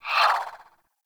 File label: Swing, Weapons Melee; Warframe; Modular Melee